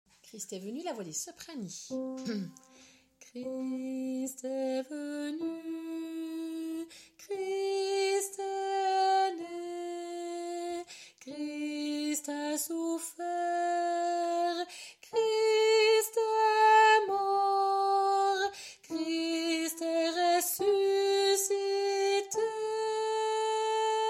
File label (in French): Soprani